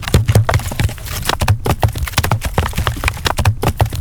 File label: Log Dirt Roll